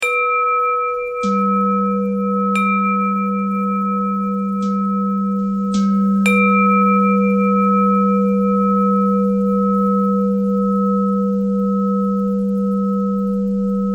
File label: bell thread pentatonic